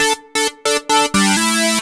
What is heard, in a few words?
2 STEP 131 SYN, House Side Of 2 Step; USB Soundscan vol.51; AKAI